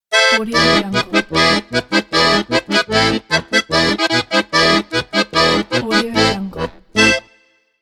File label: russian folk logo